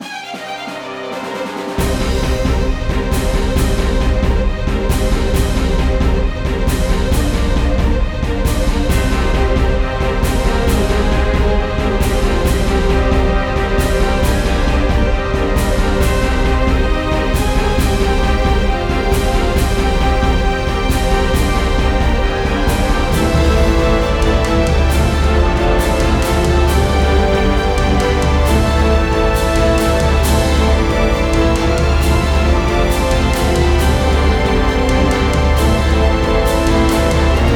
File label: Battle; Eternal Edge